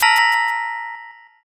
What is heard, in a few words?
sting